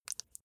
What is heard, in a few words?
flesh wet greasy squish